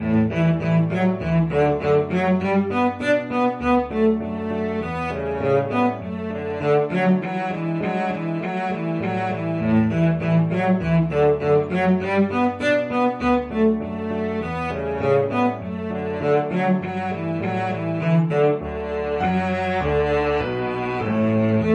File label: cello